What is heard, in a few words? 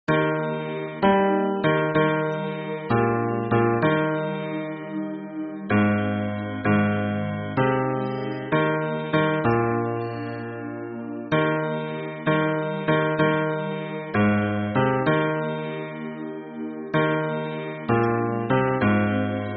hymn bass